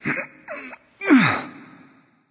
gruntlift; smite

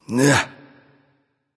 grunt attack; smite; anubis

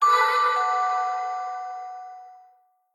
melody; smite

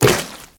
impact; Smite; Vulcan

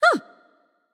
nv surprised; smite; voicepack; morgan le fay

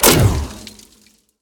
fire; smite; medusa

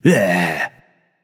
nv tongue; smite; voicepack; hachiman

